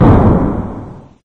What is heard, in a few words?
boom